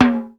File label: AX MIX TOM, DRUM; AKAI S6000 CD-ROM - Volume 1; DRUM RYTHM